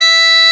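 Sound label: E5 hard vibrato, EBONY OBOE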